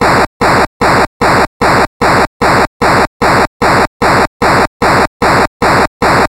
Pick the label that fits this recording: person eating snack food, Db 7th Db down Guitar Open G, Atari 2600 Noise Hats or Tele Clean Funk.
Atari 2600 Noise Hats